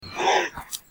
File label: kangaroo